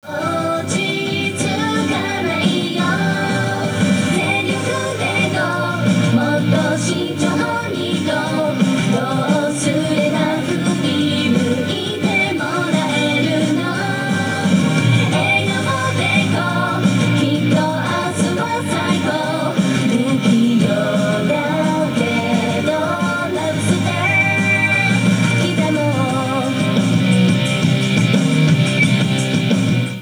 bgm inside karaoke; yakuza ryu ga gotoku kiwami 2 pc gamerip

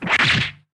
Punch; Fight Knight

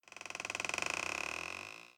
wood creak; Devour

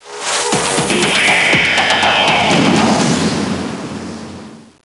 Stage Start

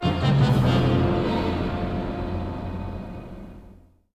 Game Over; super smash bros. ultimate expanded vol. 01 super smash bros.

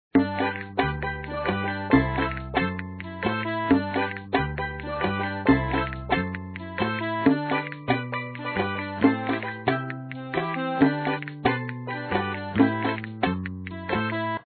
Title Music; papa s freezeria gamerip

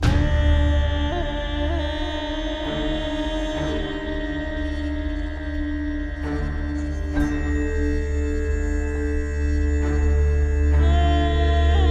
Vampires Arrive; Toussaint

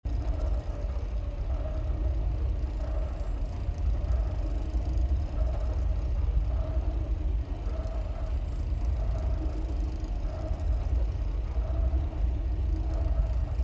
Elevator Ride Loop; Five Nights at Freddy's; FNAF Sister Location

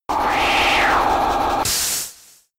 Whirlwind; Pokemon; Gen 5; Blk Wht Blk2 Wht2

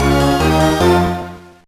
Pick-Up; santa claus junior gba gamerip 2002

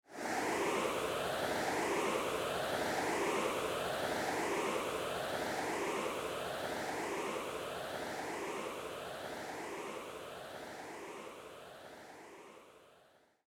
arc twinkle

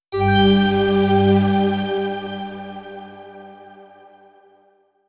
Jingle, Treasure Box

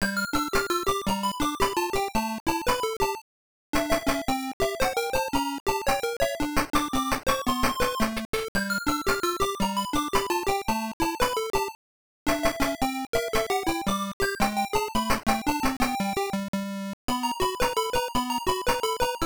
Room BGM; Wonder Boy The Dragon's Trap Game Gear

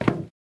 Footsteps, Human, Metal, Run, Isolated Steps; Backrooms 2D

